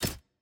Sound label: UI Log Click